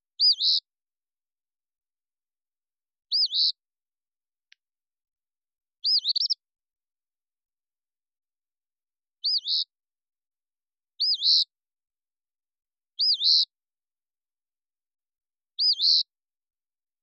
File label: Eastern Phoebe; Ontario Nature